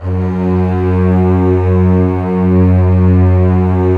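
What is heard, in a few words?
cellos, forte, orchestra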